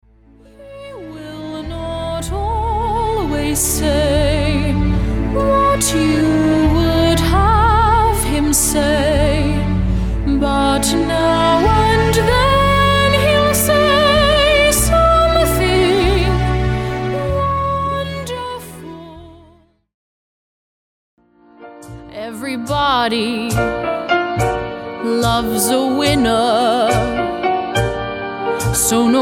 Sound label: Song Reel, Inspirational, Clear, Mature, Youthful